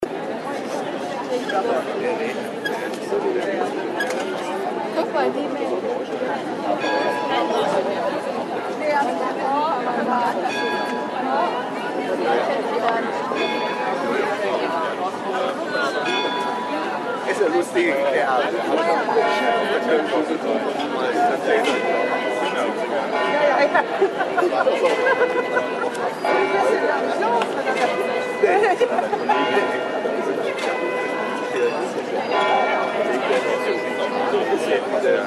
Freilichtheater Pausengeplauder; Marburg

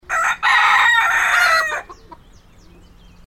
Hahn kraeht

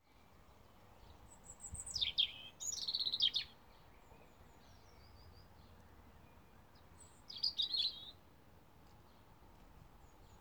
Rotkehlchen

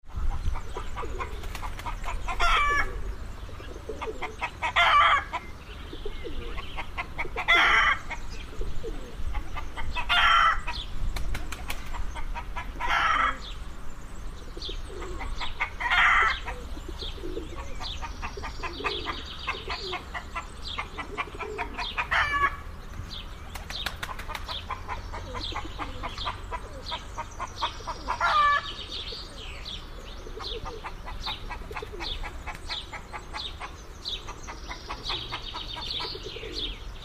Gackerndes Huhn

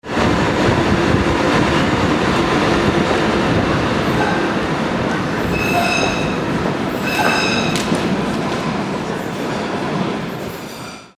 Strassenbahn

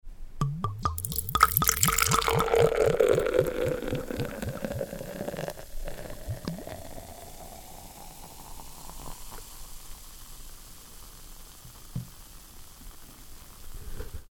Bier Einschenken

